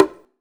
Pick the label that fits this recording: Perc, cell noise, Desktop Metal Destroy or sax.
Perc